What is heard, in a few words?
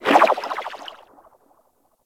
Ocean Poison Plant Pulse; Ambience; Warframe; Grineer Ocean